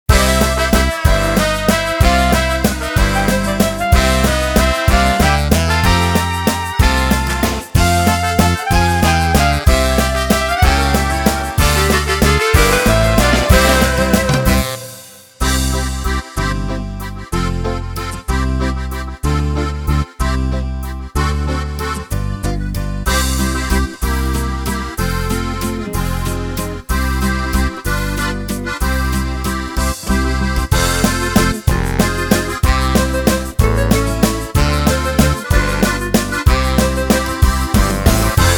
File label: Oberkrainer Waltz, Style Files for Ketron Audya